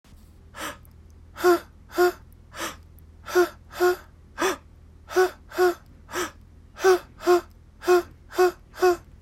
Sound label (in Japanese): 吸気性→呼気性